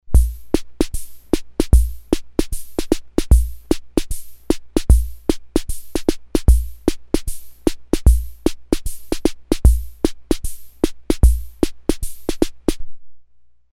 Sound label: SWING, Ace Tone Rhythm Ace FR-2L